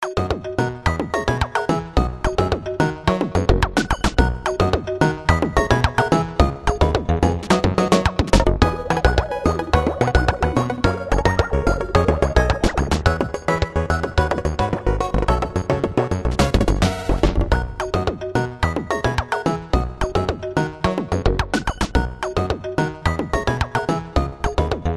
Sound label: Menu; dreamcourse